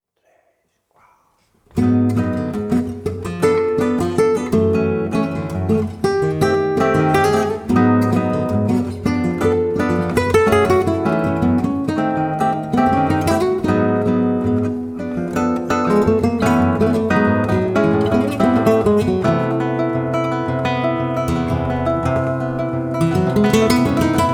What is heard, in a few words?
Samba; live at neidecks